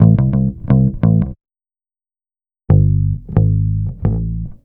bass, Roots Funk; USB Soundscan vol.41; AKAI

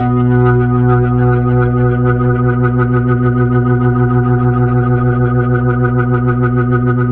HAMMOND 1B L; 3D Sound; 3D Keys VOL-1

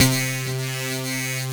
SYN, Drum & Bass, 135 BPM; Ueberschall; Drum & Bass Flash Trackz

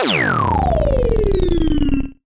Drop, Synth FX; musicradar; cartoon caper

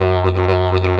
DIGERIDOO; E-MU Formula 4000 Series Vol. 5; Protozoa; Proteus3 Presets

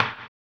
HAMBONE; Blues & Soul

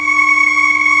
chor aaahh; Amiga Soundtracker Sample Packs

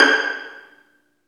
String Vlns Pizz; Roland - String Master Series; Vls Pizz

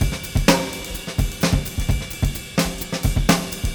Fusion 128, Beat Loops; Zero-G; Monster Beats

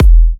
E kicks; markus hakala kicks

